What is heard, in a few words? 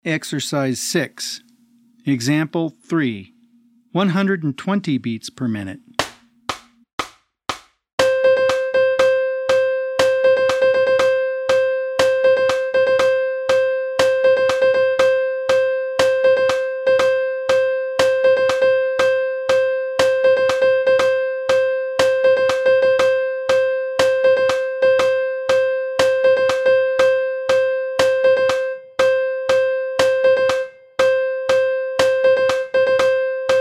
120 BPM